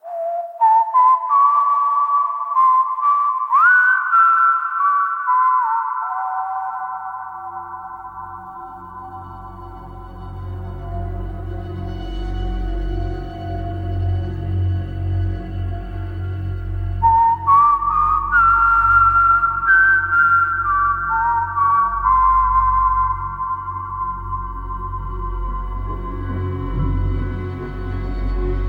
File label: whistling; Terminal